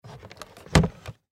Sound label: Laptop Pick Up Wood Surface, Sony Vaio Computer; Computers; Blastwave